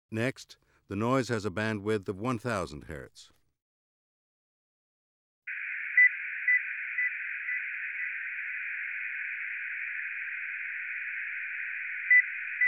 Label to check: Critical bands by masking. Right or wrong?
Right